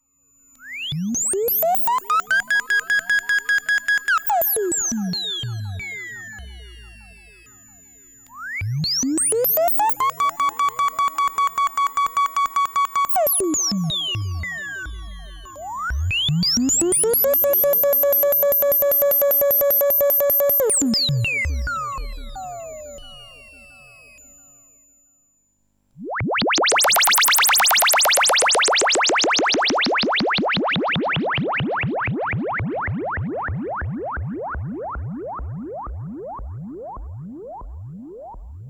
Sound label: vc LFO; SonicSix